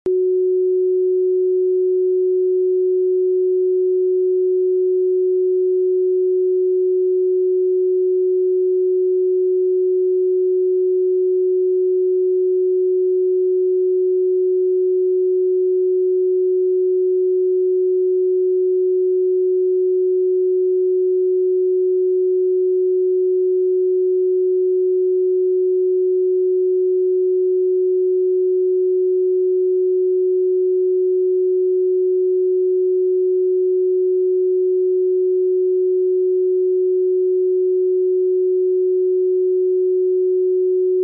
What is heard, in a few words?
Frequency 369 Hz